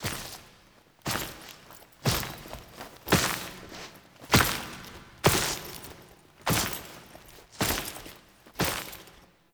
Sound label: Warframe Approach SFX, Cinematics; Duviri; Drifter Approaches Warframe